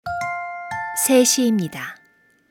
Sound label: Hourly Alert